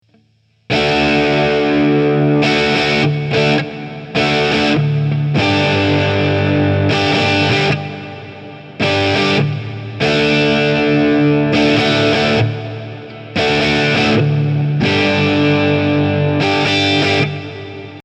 TC8210 Hall 5000

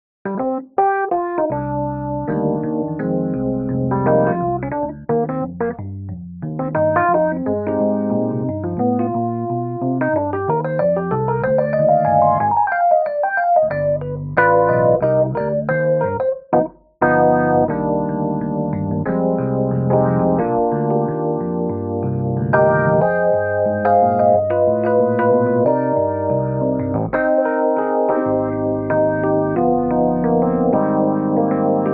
Auto Wah Soft and Fluffy

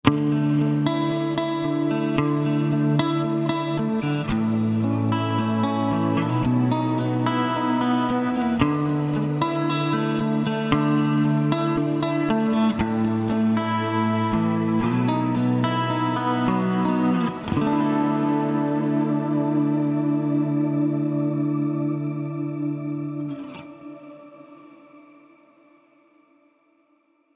Heavenly Clean

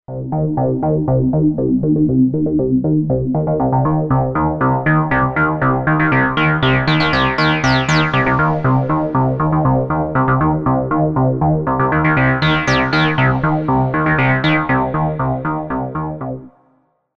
Hydrasynth

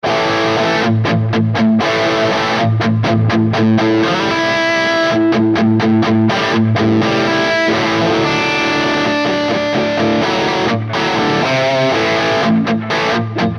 Mooer Preamp Model X Clean; PLX-100; PlexiRanger

